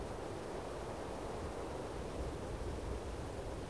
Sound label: wind; firefight